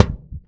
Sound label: Heartbeat Loop, Kicks; Airwindows